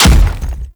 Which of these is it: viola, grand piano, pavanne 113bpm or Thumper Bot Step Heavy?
Thumper Bot Step Heavy